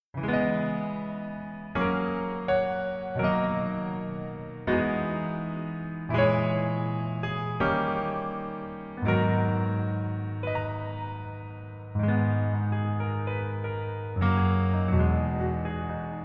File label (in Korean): Piano ver, 멜로디MR, 남자키; 낭만닥터 김사부